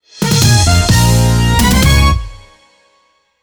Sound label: concept stinger eagle